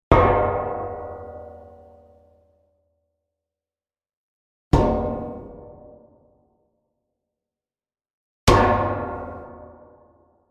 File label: Metal Bangs, 3 x Metallic bangs.; Objects